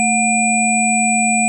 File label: Stone chord; Stone Sign Chord